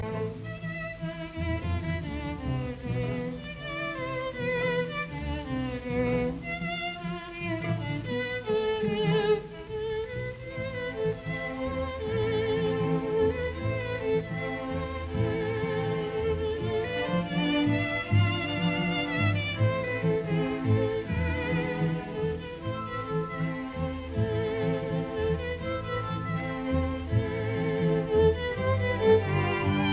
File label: Intermezzo Allegretto non troppo; Lalo; Symphonie espagnole; Op 21